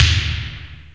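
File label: cracked snare; E-MU Formula 4000 Series Vol. 2; OrbitPercMaster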